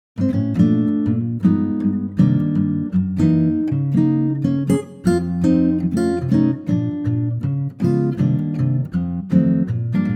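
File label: Bb acoustic Guitar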